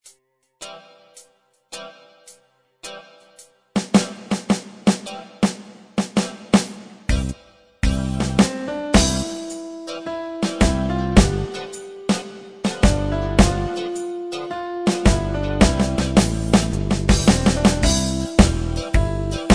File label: rocknroll